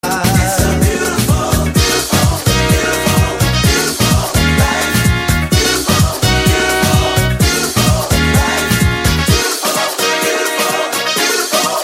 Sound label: hip hop call tone